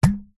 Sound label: household blender lid pull remove like plunger